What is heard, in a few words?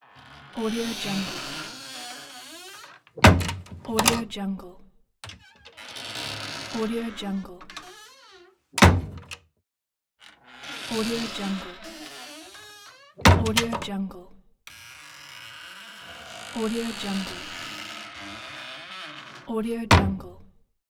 close creaky wooden hatch